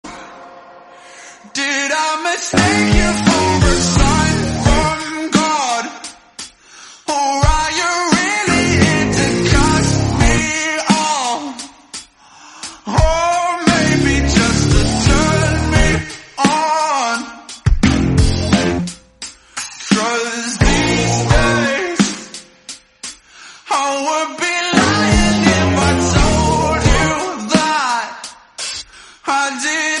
english song

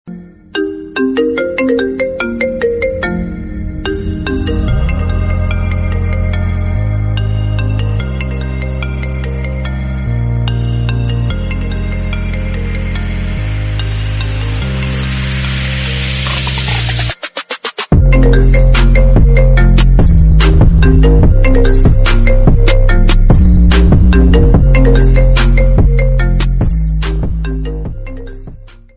iphone remix trap

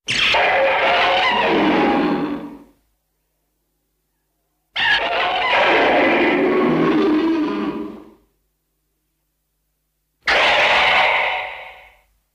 roar; rodan 1956

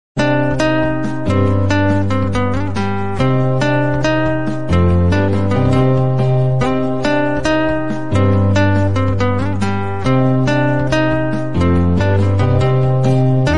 bgm telugu

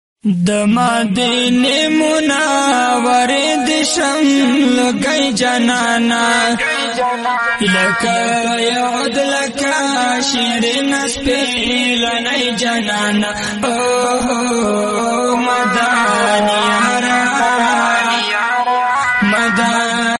naat sharif pashto

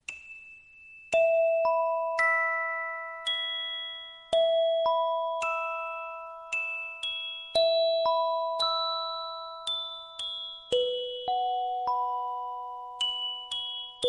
music box instrument; vivo

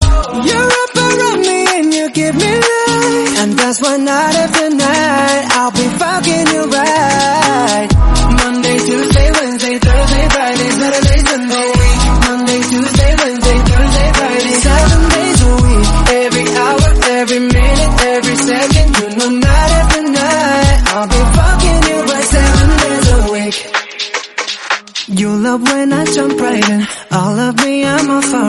explicit version english song

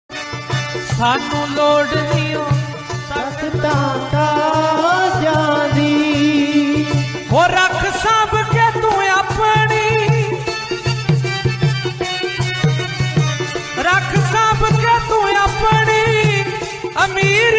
punjabi

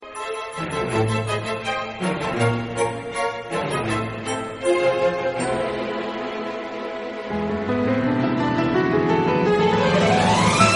trap remix instrumental bgm

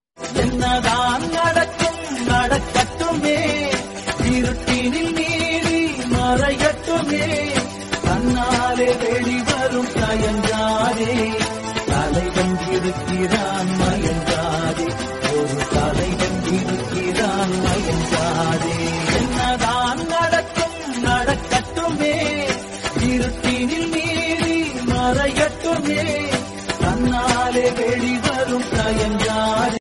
tamil sad old bgm

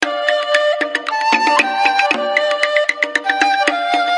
samsung notification tone

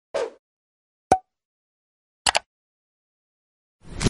button sound

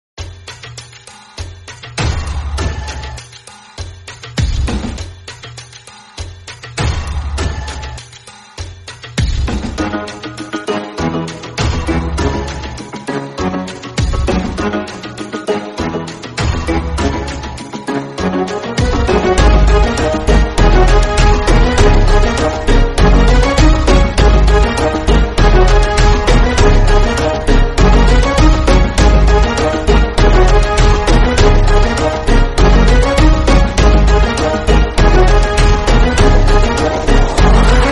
epic battle theme remix bgm; One Piece

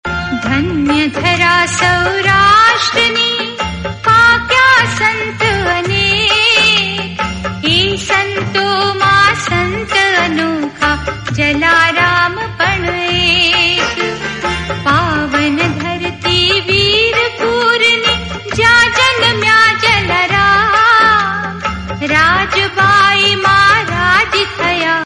amritwani marathi

